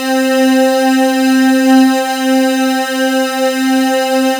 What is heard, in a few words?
RAW PUL; MKS